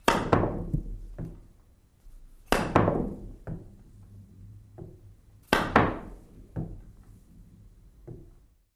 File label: Billiard Cue Stick Hi